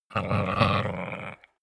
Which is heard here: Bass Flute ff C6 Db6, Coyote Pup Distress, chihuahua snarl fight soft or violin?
chihuahua snarl fight soft